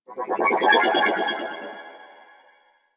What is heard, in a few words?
Exterior Distant Space Calls, Ambience; Warframe; Railjack